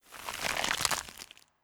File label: Golem Ice